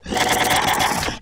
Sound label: pain; custom monsters; glitchzombie